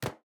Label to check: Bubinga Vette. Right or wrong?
Wrong